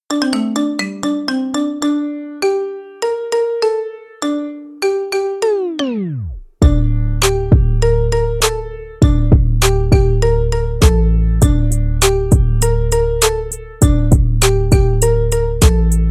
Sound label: marimba remix